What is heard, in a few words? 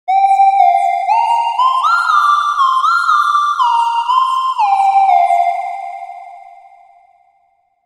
Whistle Synth